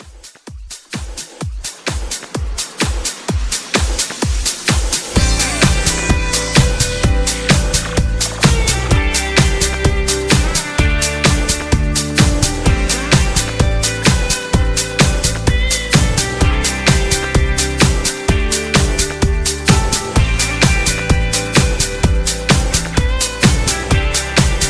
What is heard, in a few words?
80s Pop Re Drum 128